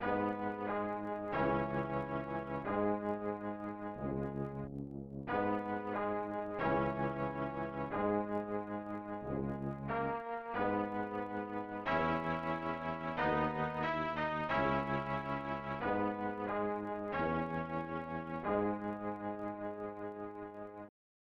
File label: Unused Jingle; Ren Stimpy Buckeroo; snes